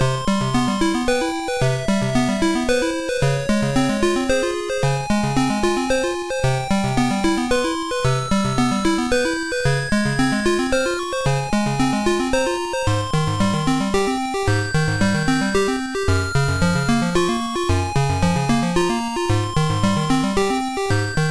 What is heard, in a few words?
Underwater Theme